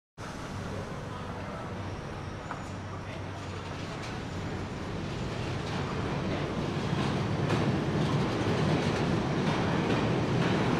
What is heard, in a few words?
Subway Train Arrives Departs